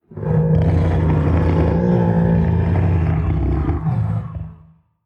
damage; Barotrauma; Charybdisold

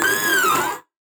DEFENCEBOT damage, Defensebot

